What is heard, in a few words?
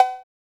Perc; SSO Percs